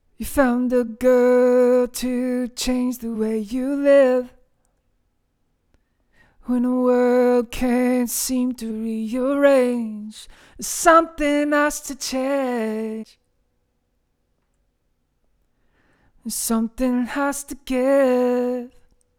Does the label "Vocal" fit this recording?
Yes